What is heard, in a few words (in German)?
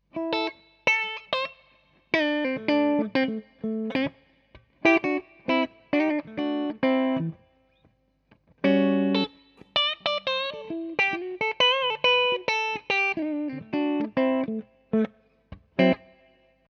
Hals+Steg PU clean